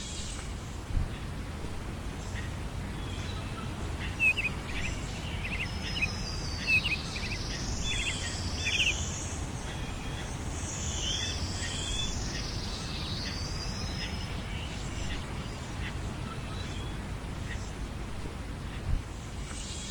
Woods

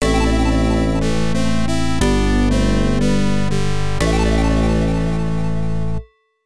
Jingle (OPN)

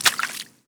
run water

